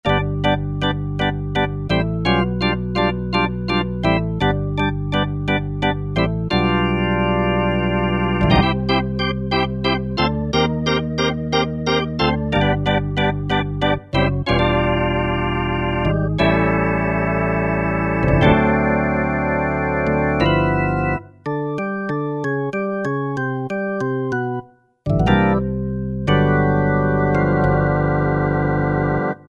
Blues Organ, Alesis QS8; Factory Preset examples

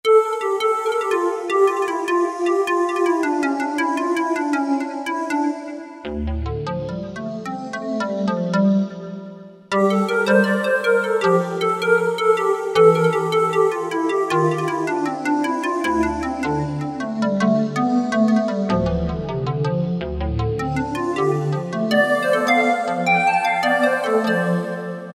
Bamboo Voices, Kurzweil K2000; Kurzweil K2000 V3

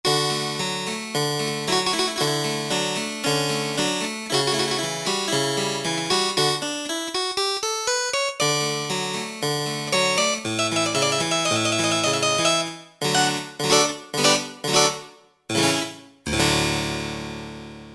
Harpsi; Alesis QS8; Factory Preset; TrueHarpsi

